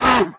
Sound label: grunt short, zombie vox; creatures